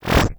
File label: Corrupted Notes; Warframe; Bard Quest; Bard Boss Fight